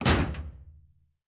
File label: door metal close end; Rust